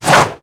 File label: grass; Pokemon Solar Light & Lunar Dark 1.0.1